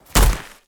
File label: creature walk; snowstalker